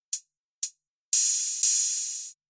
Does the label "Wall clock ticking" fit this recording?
No